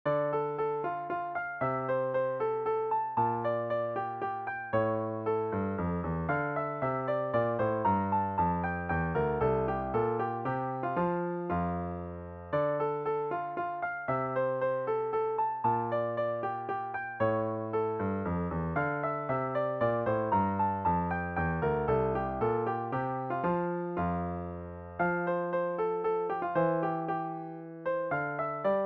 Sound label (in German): Sarabande d Moll, Klavier